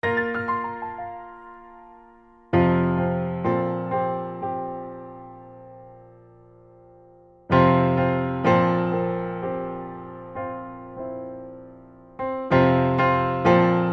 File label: Introspective Piano Etude